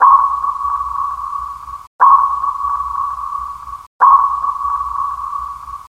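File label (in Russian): повторяющийся 3 раза громкий звук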